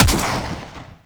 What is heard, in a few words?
Swarm Missile Shot; Enemies; Warframe; Eidolon; Jetpack Marine